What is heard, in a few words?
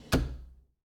Foosball Goalie Slide